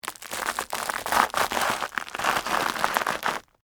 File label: Texture, Crunch, Footstep, Grind, On, Ramen, Noodles; Crunches